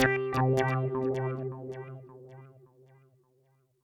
Synth C, Synth Prophet One Shots; Electronisounds

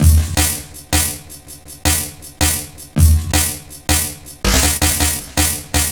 Stretcher 162, Drum Loops, Jungle; Jungle Warfare 1&2

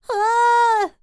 TURKISH, Vocal World Voices NEAR EAST; ILIO; Vocal Planet VOL-5

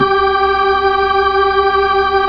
B-3, Keyboards

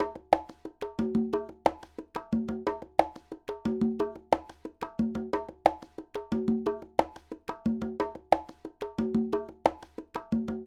Conga, Latin Percussion

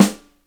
PICCOLO SNARE; InVision Interactive; Lightware VOL-1; Instruments & Percussions

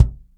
AMB KICK, ACOUST KICKS; Greytsounds

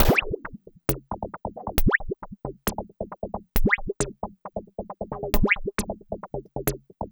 Percussive; Sonic Foundry (Sony Creative Software); Machine Language

